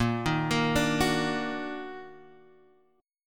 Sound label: Eb Minor Major 7th Bb, chord